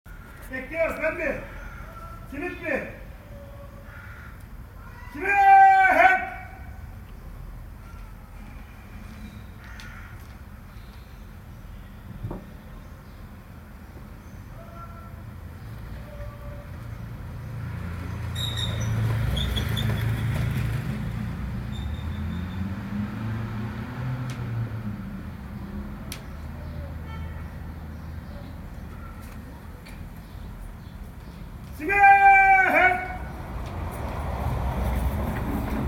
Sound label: besiktas simitci